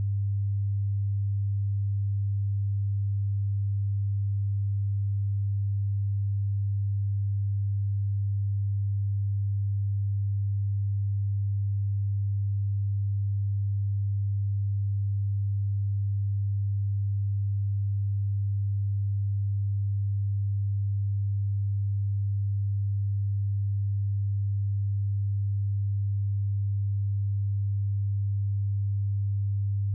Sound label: sine 100hz